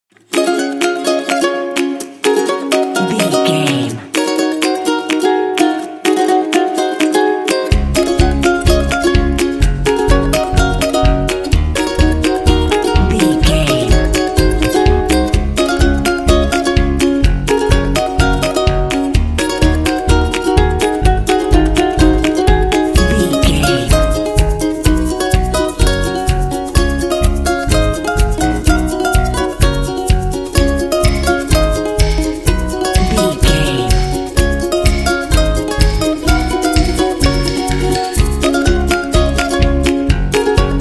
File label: tropical fun